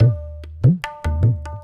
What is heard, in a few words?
Tabla